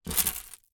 household necklace plastic pick up from wooden table